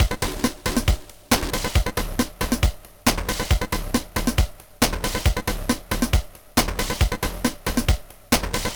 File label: Beat using individual pitched sounds 3, Tr 505 bend